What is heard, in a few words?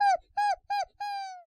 Fall